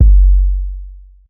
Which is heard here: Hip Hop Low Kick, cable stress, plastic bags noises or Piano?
Hip Hop Low Kick